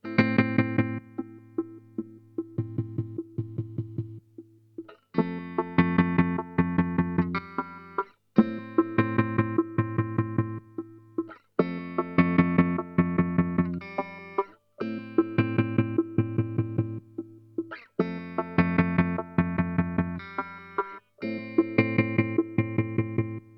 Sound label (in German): Boss SL-2 langes Attack und Duty